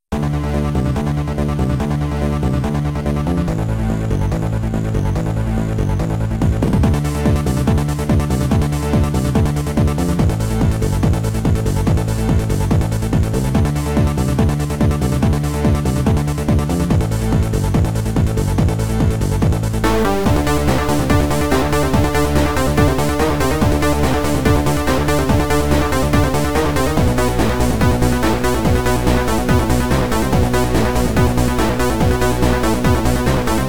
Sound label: Final Frontier (Sound Blaster Version); zone 66